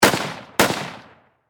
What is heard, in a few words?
Warfare Rifle .30 Caliber M1 Garand Semi Automatic Double Shot Distant Perspective; Rifle Sound Effects; SFX Bible 6